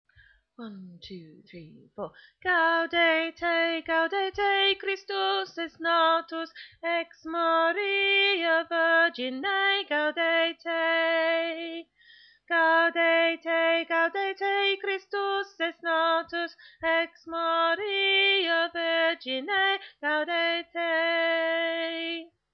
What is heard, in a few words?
Alto